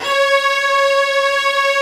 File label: STRINGS C#4